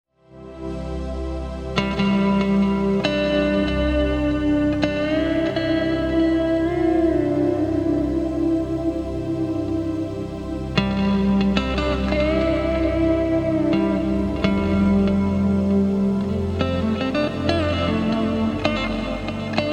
intro Pulse Tone Reeves CS2 Dyna CE2B FF AS Hall Reverb